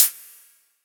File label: HiHat, Psytrance; Drum Shots